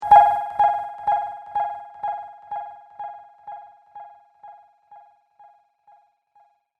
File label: Warbled Bleeps Echo Delay; Beep Sound Effects; electronic sound effect